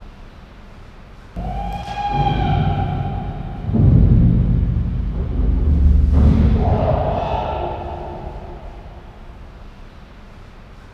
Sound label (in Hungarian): plexifal mögül, csimpánz; allathangok; veszpremizoo 2013